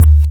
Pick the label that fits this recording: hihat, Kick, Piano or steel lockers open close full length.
Kick